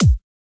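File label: drum beat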